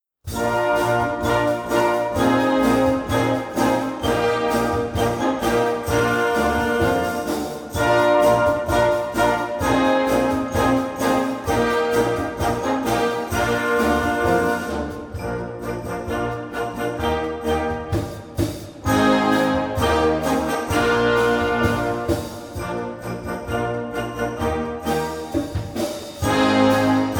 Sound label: Rock Feel; Rock Collection